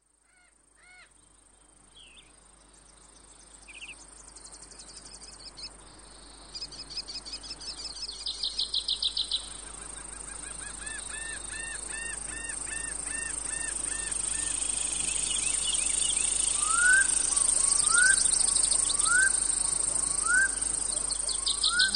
peaceful lazy summer day relaxation audio

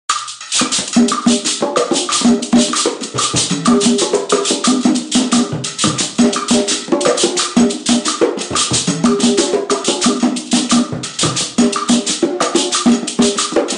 guarapachangueo

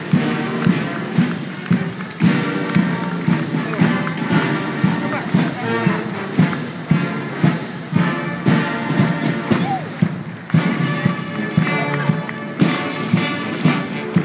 drums beating

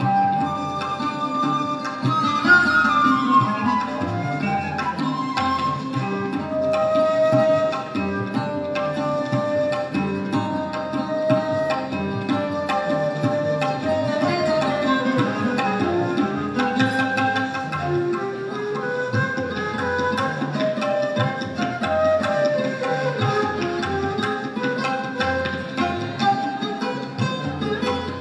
ragatala ensemble yoga festival

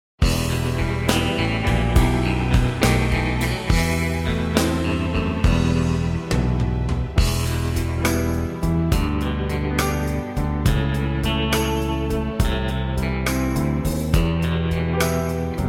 House of the Rising Sun backing track

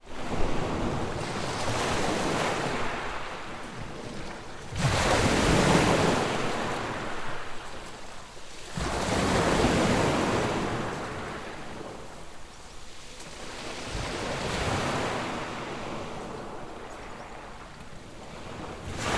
waves on the beach at whitstable

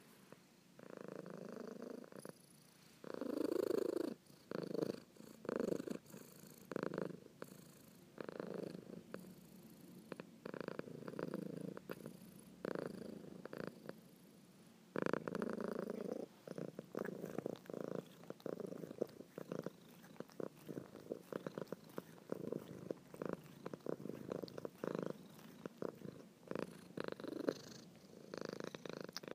purring little monster

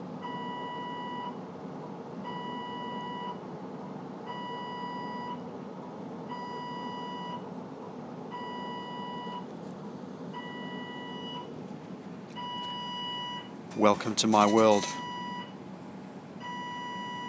alarming

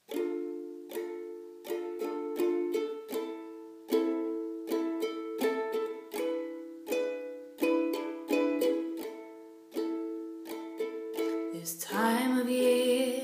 sonnet sung; sonnet 73

